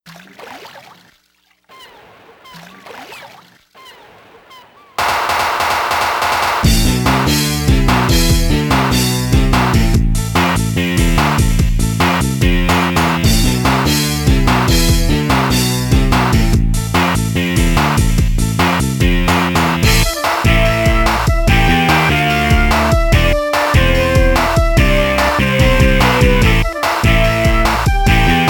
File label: Flute in the blue sky; the king of fighters 95; Mediterranean Sea Stage